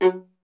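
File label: STR VLA F1, strings viola; Miroslav Vitous; Solo Instruments VOL-1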